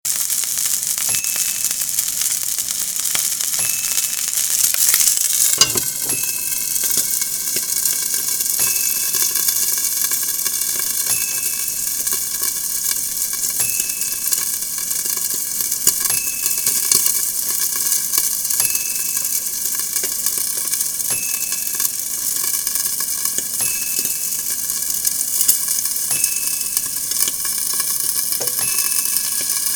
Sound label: High Sizzle in a Frying Pan With Lid